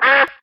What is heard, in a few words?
duck hit; xinjiang army